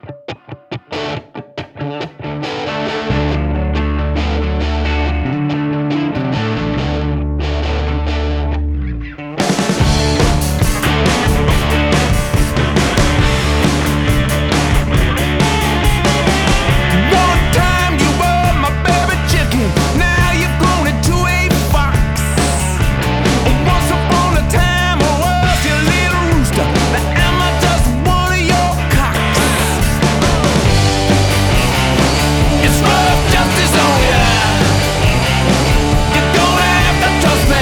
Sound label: Jitter 20Hz FM 160kHz Peak Deviation, Yamaha CDX496